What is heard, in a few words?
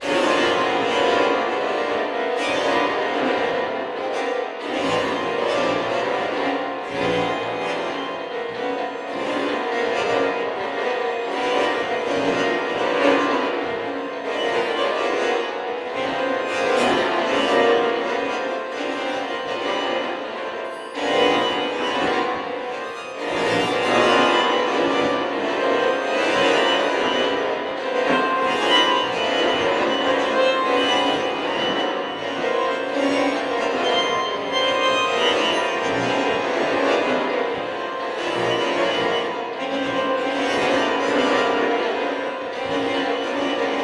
Strings; Xenakis